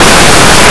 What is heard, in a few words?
rattle; Blue Heat